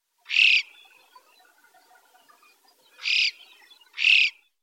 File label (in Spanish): chova piquigualda